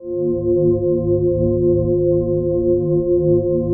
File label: PAD, DIGI-PADS